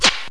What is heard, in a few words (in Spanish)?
LATIGO; El Juego De La Oca Loca